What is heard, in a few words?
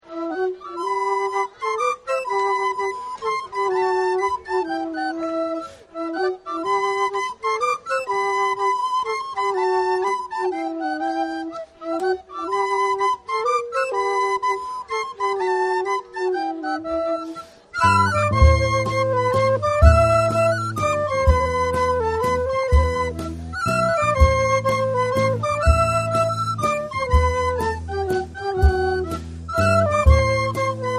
Mazurka; Musiques folkloriques; Balèti du Bal des Feux de la St Jean d'Aix